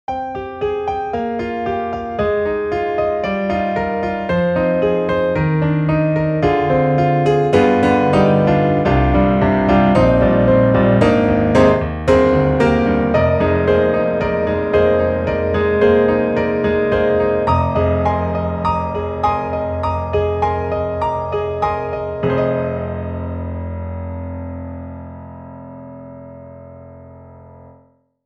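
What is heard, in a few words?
Bosendorfer, piano; Vienna Symphonic Library; VIENNA SPECIAL EDITION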